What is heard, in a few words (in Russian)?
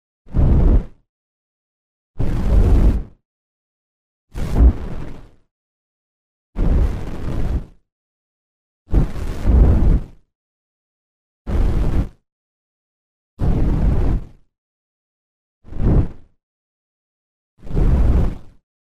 vrashhenie fakelom